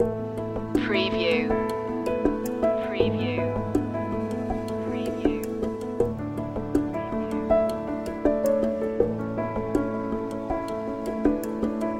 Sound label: building success loop